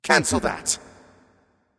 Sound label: Orbital Strike Cancel; Rama